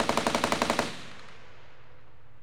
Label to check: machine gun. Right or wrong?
Right